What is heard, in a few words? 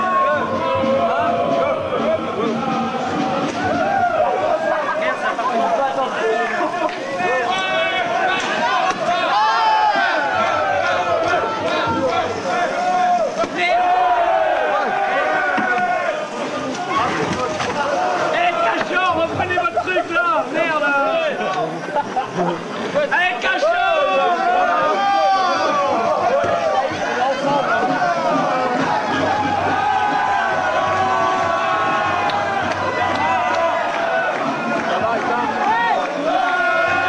Coff